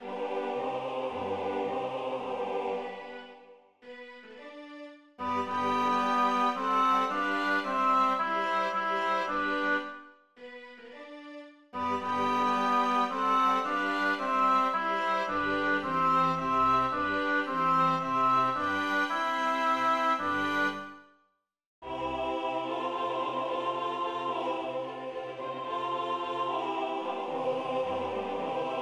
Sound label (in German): Benedictus Tenor; Krönungsmesse